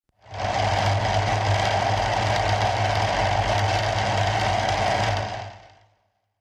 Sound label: horror noise; soundscrate